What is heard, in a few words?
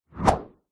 foley swipe whoosh whip fast